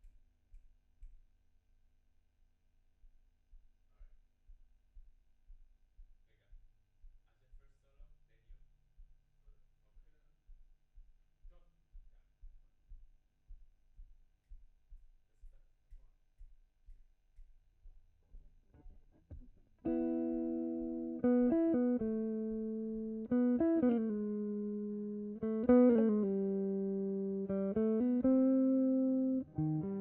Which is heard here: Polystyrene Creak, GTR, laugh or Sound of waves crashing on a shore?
GTR